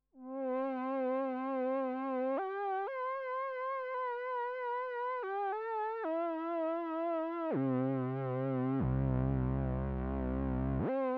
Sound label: MOOG MATRIARCH Aftertouch; Orion